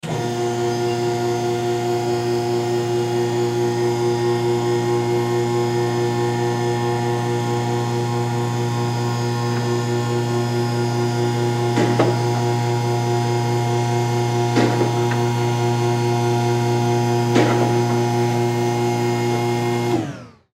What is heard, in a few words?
Rotary Lift Raise, Rotary Lift Raise Up; Grinder; Industry; Sound Effects:Machines; Blastwave